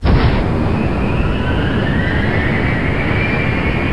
beam charge

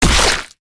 Teleporter Blood Splat; Evolve; Wraith

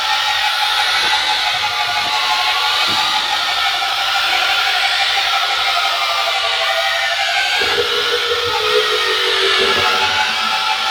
Banshee Missile Homing Loop; Evolve; Elder Kraken

